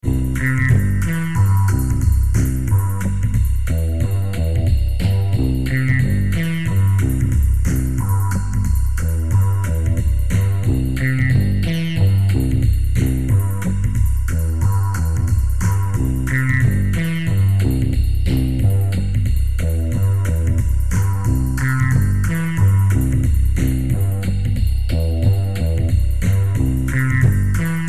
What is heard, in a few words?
Drum